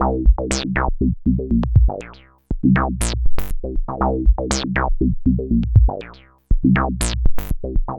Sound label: Bassline, Prototechno